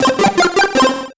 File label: Solo Chip Arp Positive; MUSIC EFFECT; startide